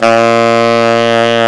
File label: TENOR SAX; Alpine Volksmusik